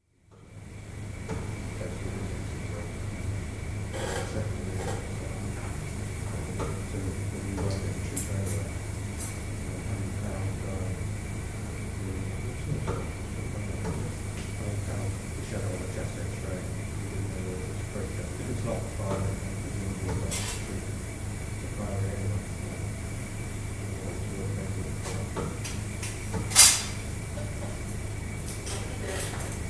Operating Theatre atmosphere, during later stages of surgery (General perspective recording); Hospital Sound Effects; BBC